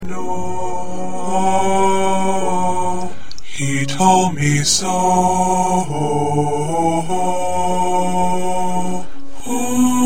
Baritone

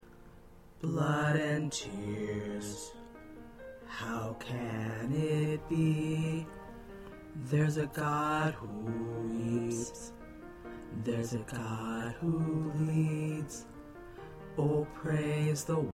Bass

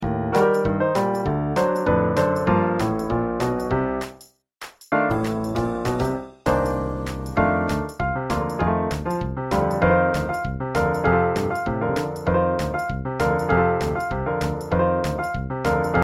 Accompaniment

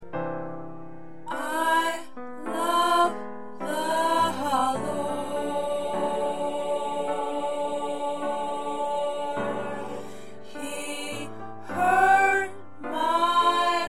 Soprano, choir parts

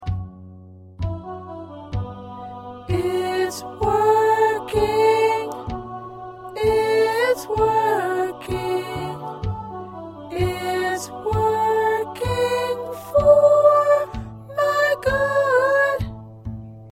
Soprano

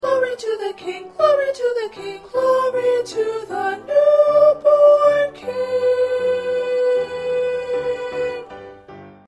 2nd Soprano

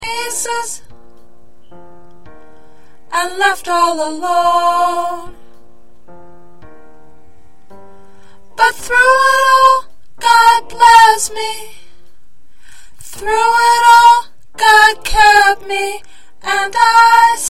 Soprano

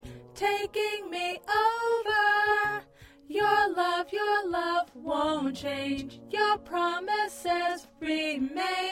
Alto, choir

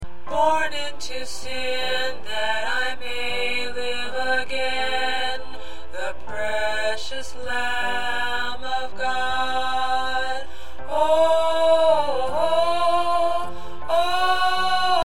Tenor, choir parts

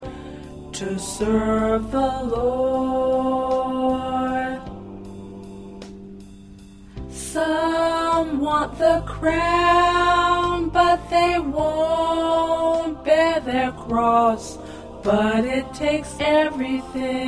1st Alto

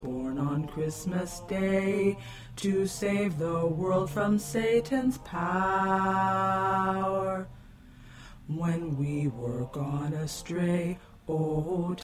Baritone

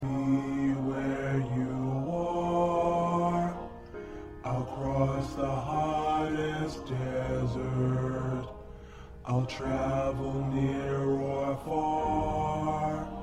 Bass

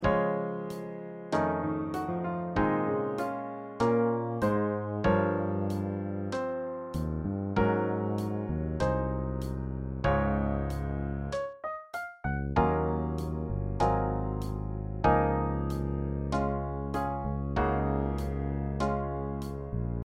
Accompaniment

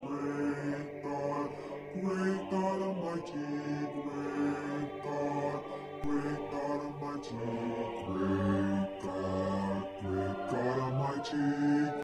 1st Bass

